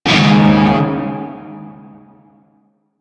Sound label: Shawzin Yo Chord B; Warframe; Narmer Shawzin